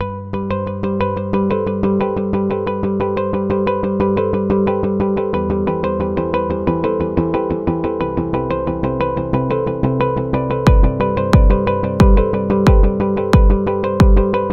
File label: Soft Corporate; office background